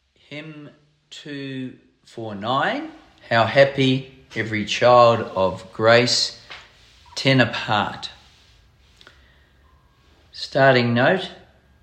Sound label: Tenor How Happy Every Child Of Grace SATB; Hymns for Jubilation; in house choir